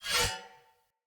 Short Sword Whoosh Accent; Weapons; Swords; Sollos

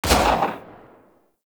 Fire Shot, Weapons; Lato Redux; Warframe

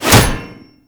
Shield And Sword Catch; Warframe; Grineer; Mortifor

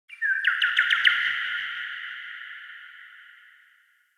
Cardinal Male React Positive; Objects; Thawtide

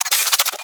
Arch Rail Gun Reload Mech; Warframe; Archwing

